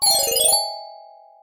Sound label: Reset Button pitch